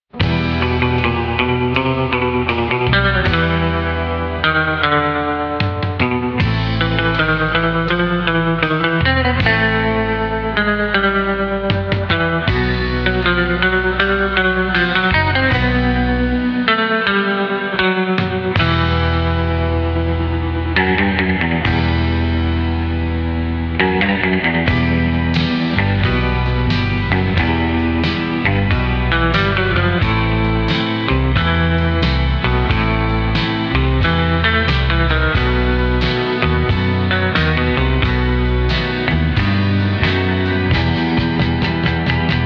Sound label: Instrumental